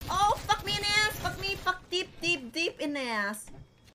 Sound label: sound alert; night0wlita